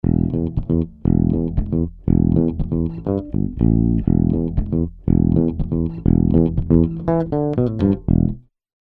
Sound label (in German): E-Bass Medium Drive EQ Transformer; SSL Fusion